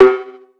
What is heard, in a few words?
Perc